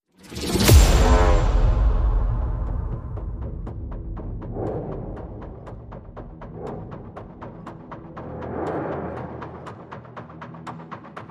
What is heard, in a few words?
Drums